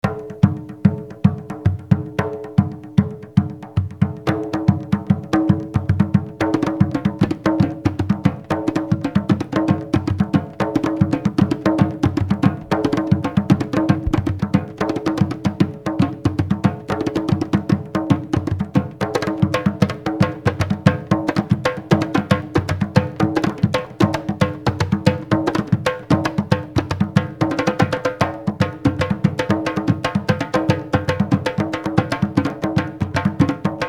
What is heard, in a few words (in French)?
rythmes takouta